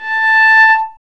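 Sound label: strings viola, chamber harm; String Master Series; Combos 4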